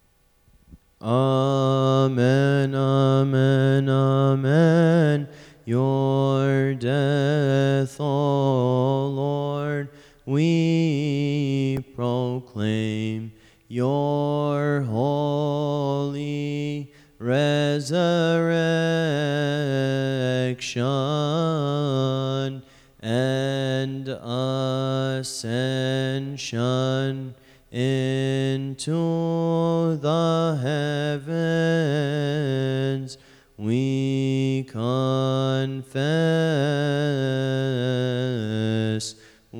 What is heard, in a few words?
Amen Amen Amen